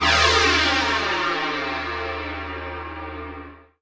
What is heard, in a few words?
GONG; Szkola Koziolka